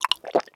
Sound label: pills use; Escape from Tarkov